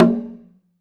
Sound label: Perc, Alesis DM5